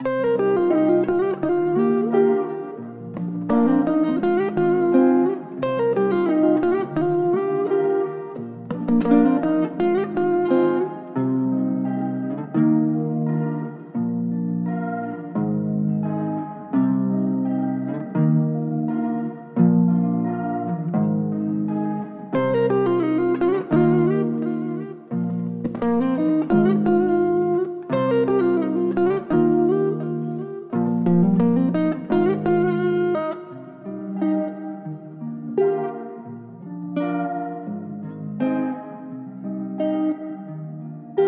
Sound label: 86 BPM A Min, Guitar Loops; Cymatics; Journey Guitar Loops